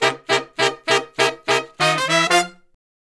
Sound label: Horn Section